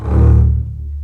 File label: marcato, String; East West; The Ultimate String Collection